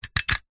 office staple gun metal mechanism loader release

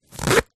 foley wallet zipper

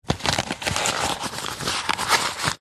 wallet leather rummage receipts

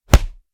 Fist Punch No Vocal; noise creations

